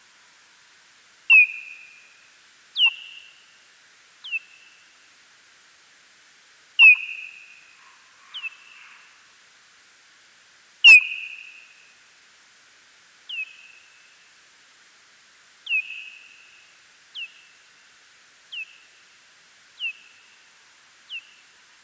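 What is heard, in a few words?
Nyctalus leisleri, bat; Boehm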